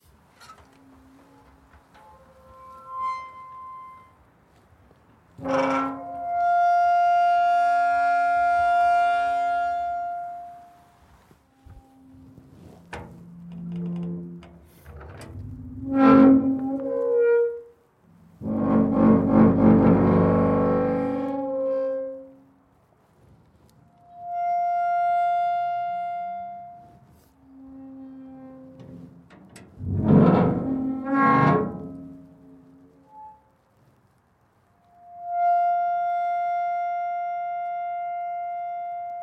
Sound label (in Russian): otkrivaya i zakrivaya malenkuyu metallicheskuyu dver v gorode